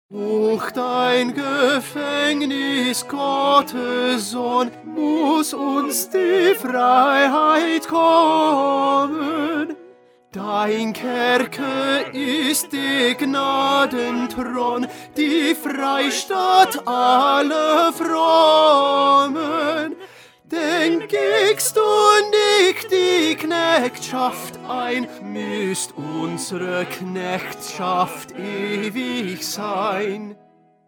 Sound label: Tenor; Bach; St John Passion